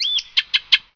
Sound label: bird